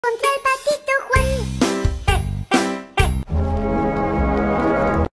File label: screamer; fnaf; ballora; fnaf sl